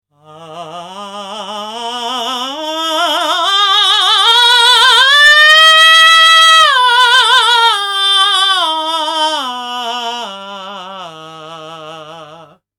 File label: acuto